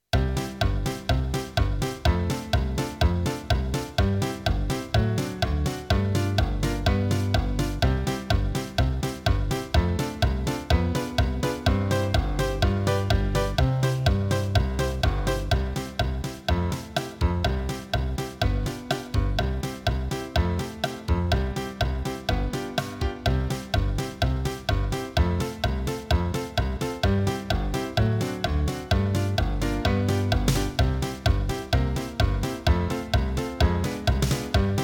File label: Encore Piano